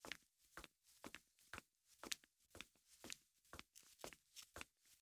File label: run Stone loop